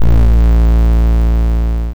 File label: LowAlarm